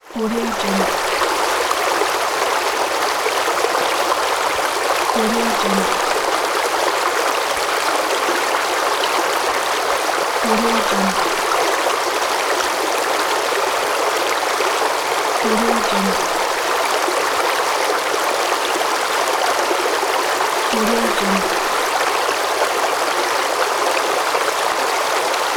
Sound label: forest stream; soundcreator